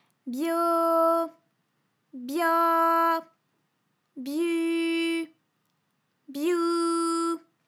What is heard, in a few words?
byo byau byu byou, vocal library